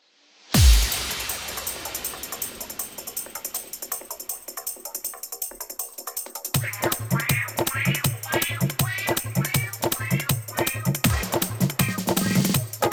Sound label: Dholki Bass Dance Mix